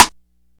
reverb snare drum sample a sharp key, steel snare drum sound tuned to the A# note